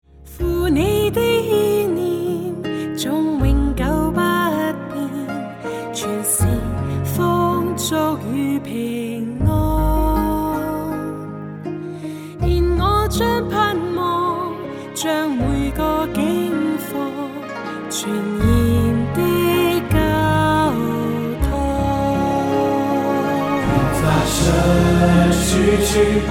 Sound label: Peace Cantonese